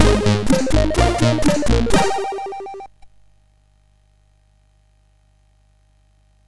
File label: sid MOS6581R4